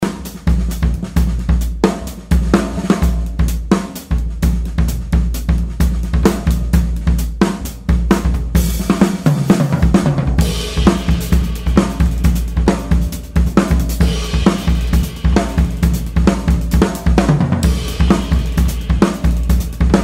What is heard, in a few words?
big beat groove